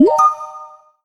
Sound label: notify